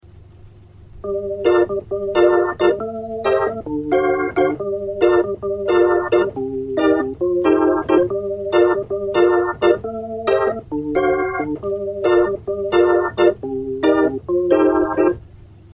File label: rotary